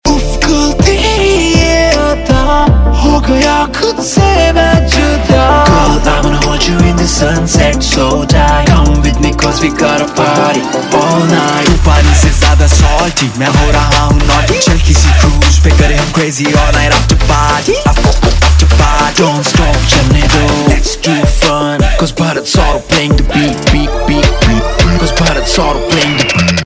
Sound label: Ringtone, Punjabi